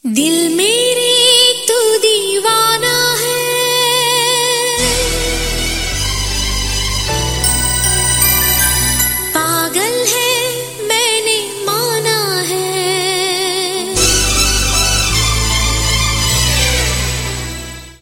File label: female, hindi song; sooryavansham